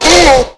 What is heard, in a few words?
Rap Bark, Dino, Raptor